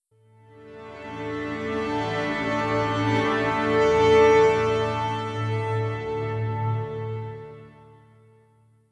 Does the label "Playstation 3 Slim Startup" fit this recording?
Yes